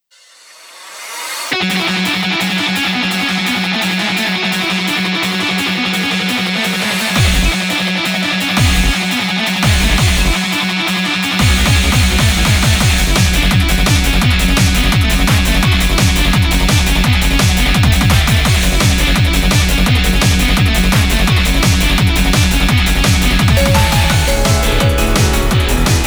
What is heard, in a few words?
Battle Music; Heartomics Lost Count